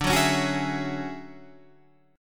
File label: D Major Sharp 11th D Guitar Standard, chord; strummed; JGuitar